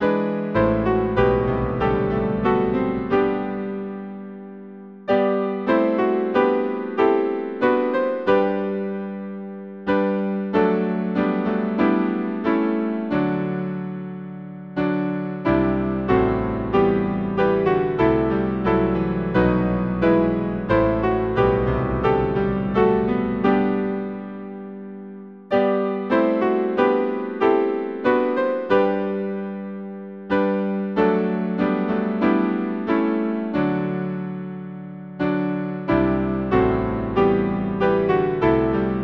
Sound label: piano, Hymn; Awake and sing the song, St Ethelwald